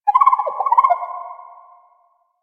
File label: Drifter Camp Valley Water Creature; Ambience; One Offs; Warframe; Quests; The New War